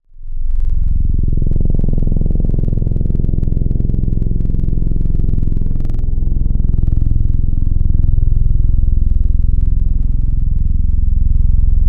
One Shot LD Brass Poly, synth; Synth One Shots; Synthetic's Serum Collection; Echo Sound Works Core Tables

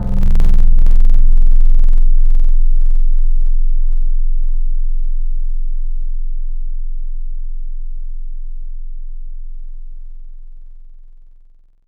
Keys Kalimba; Synthetic's Serum Collection; ESW X Keys

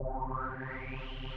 synth orrghhhh in C; 'Effection' samples; lolicore

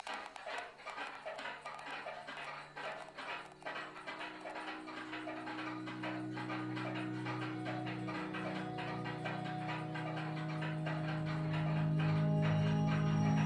His Chimes; lolicore

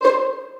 Pizz Mid C5; Aubit; Louv Vol. 1 Noises

